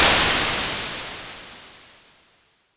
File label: impulse